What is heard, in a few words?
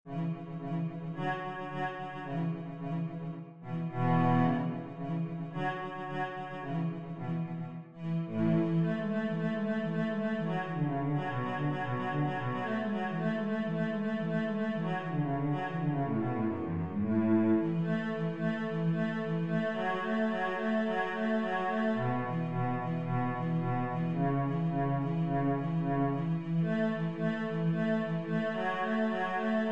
fiddle cello